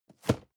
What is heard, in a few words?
cardboard box medium place slide; objekte